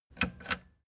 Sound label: plastic button latch click disable slow